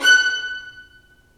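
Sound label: violins marcato, String Sections; Roland LCDP13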